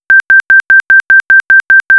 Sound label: False Start Sound; ScStw